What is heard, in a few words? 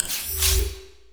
find match find; ui